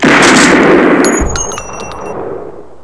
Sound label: shotgu 2d; gunslinger